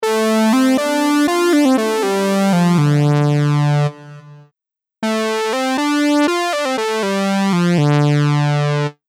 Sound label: Moog Solo FX; pure